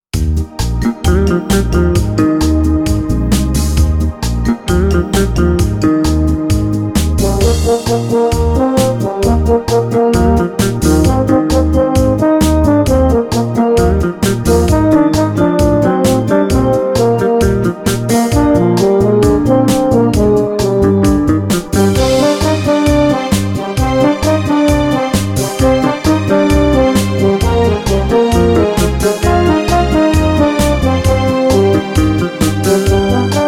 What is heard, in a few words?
Es dur alto